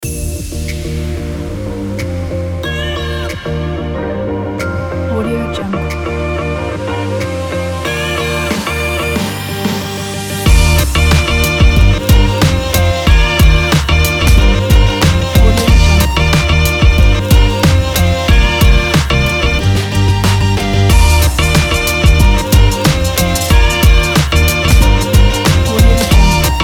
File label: hip hop